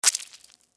Squish Juicy Squeeze; Foley Squish Vegetable Food Wet Juicy Squeeze; Squishes; Objects; Blastwave

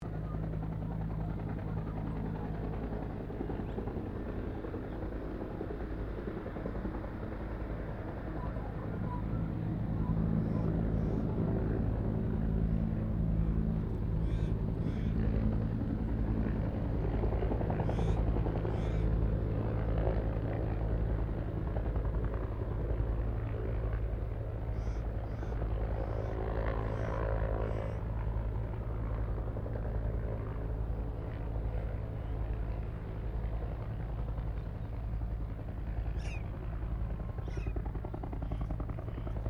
HELICOPTER 1967 BELL UH1 IROQUIOS HOVER DISTANT; RAN UH-1 Iroguios; Helicopters (Sound Effects:Vehicles)

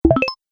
Game Menu Select, Video Game Sound Effects

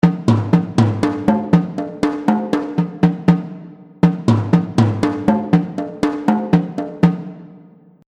War Drums Loop; Musical Sound Effects

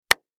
Human Hand Clap Bare Single; Applause

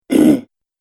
Goblin Big Effort, monster; Monster Sound Effects; horror sound effects

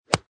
Sports Golf 5 Iron Swing Impact; Golf (Sound Effects:Sports)

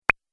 Idea Single Pop, cartoon

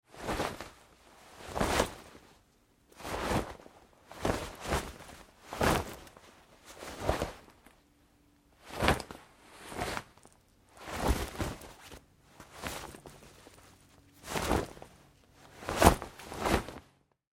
Foley Fight Moves Nylon Shorts Singles Lite; Wrestling and Struggling

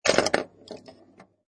Pinball Sound Ball Move And Hit Metal Objects; Video Games; Lynne Music

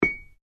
Anvil Impact Light, Small anvil impacts; Metal; Materials

